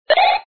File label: Jump sound; Wario Gets Straight